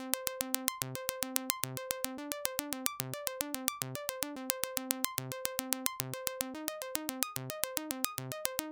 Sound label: synth